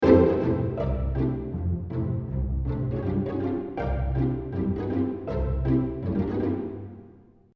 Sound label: Symphonic Orchestra 60 Piece, pizz